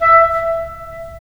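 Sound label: wind clarinet; LA Composer vol. 3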